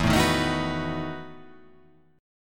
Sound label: E Major 7th Suspended 2nd Suspended 4th